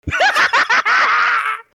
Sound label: crazy laugh